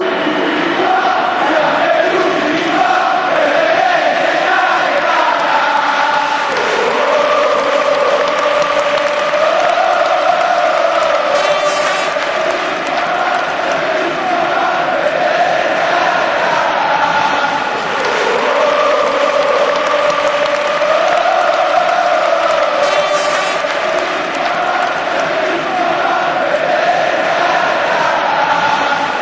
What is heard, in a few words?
kaka chant